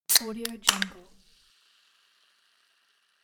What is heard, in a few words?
soda can opening